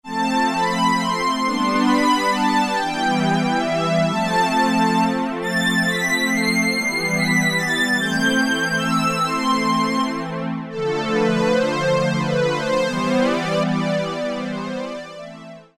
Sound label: HighString, Roland MKS-50; Factory examples